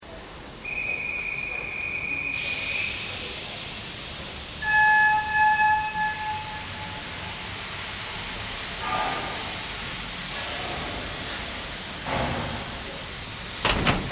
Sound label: Game Soundtrack; Stop the Train!; 40 minute soundtrack to accompany the gameplay; Harrison Media